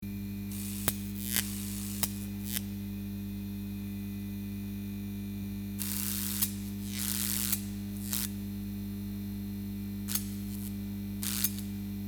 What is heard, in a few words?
Neon light buzzing and flickering